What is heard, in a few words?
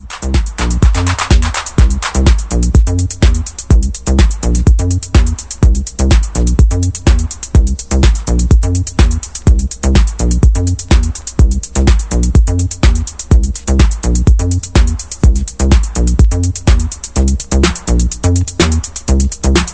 Instrumental; house